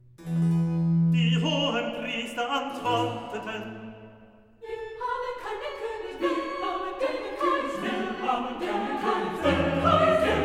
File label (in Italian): Recitativo, Coro; evangelist Die Hohenpriester antworteten; Wir haben keinen König; Klassika; Handel Masterworks